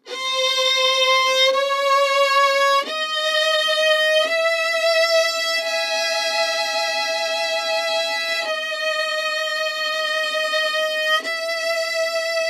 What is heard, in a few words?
Strings